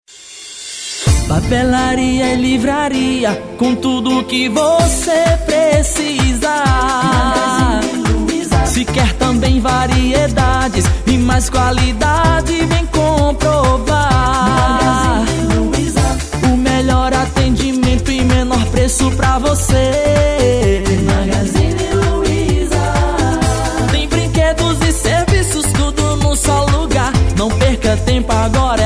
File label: JINGLE; MAGAZINE LUIZA